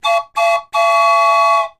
Toy Train Whistle: Double Pitch, From Close Perspective.